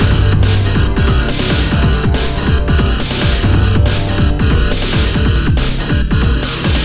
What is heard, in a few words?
bgm loop; love2d